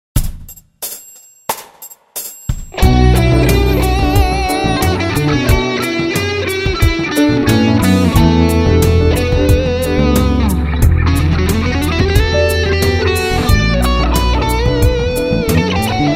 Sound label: Smooth Piano